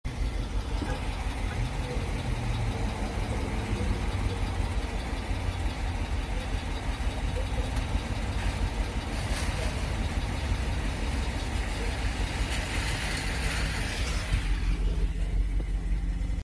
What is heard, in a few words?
hemi ticking